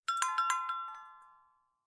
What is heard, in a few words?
bell ringing